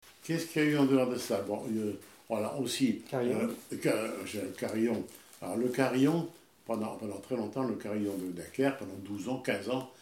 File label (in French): carillon